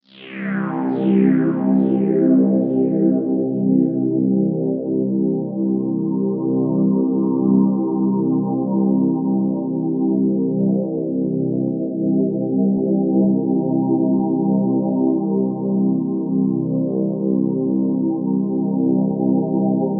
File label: Atmosphere ominous; sumo blanco